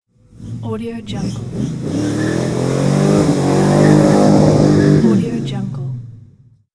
race car tire burn out; electronplanet

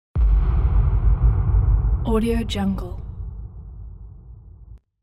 boom

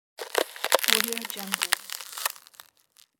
ice cracking

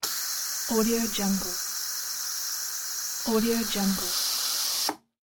industrial steam release pressure cooker short

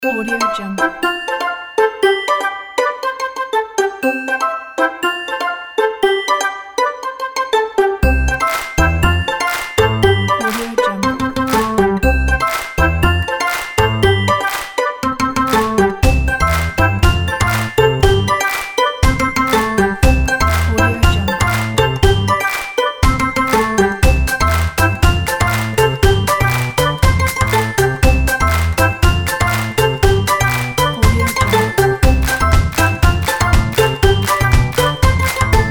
funny comedy

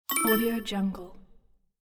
coin or point pick up

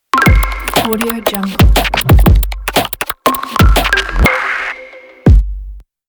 hiphop logo; dimasx

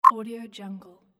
advanced tech move

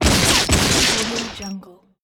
the guns shots

